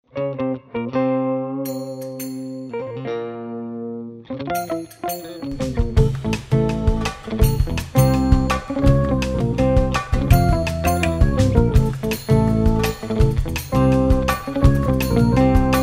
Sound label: Turkish Funk